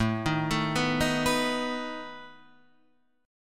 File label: A Suspended 2nd Flat 5th A, guitar; Asus2b5 Chord {5 6 7 4 4 7} arpeggiated